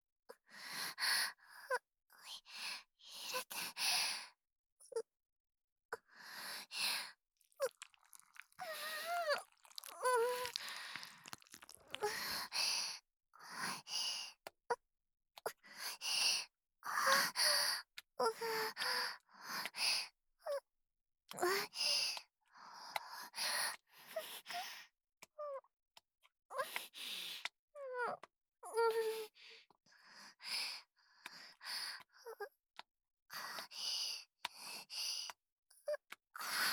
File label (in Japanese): 挿入 喘ぎ; SEあり